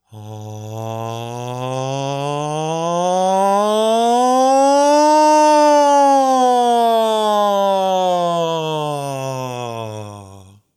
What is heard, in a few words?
Pitch change; Westside Studio